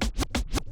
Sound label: Cuts, Vinyl Record Samples